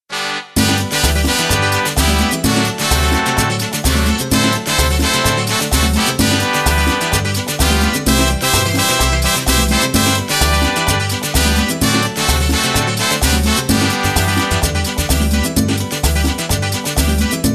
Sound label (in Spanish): Merengue Sonero